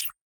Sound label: move queen; chess